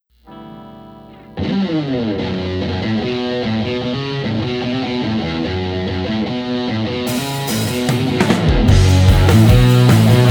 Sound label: rock pop